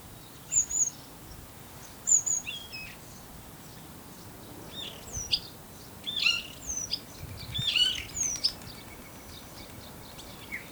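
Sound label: starling song, (Yes, this is one bird) Starlings make a plethora of sounds, usually imitating other birds; Starling (Szpak)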